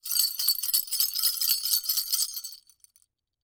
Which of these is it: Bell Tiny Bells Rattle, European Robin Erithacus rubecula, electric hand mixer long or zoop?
Bell Tiny Bells Rattle